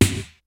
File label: FUNK, DRUMS; Kid Nepro; Elite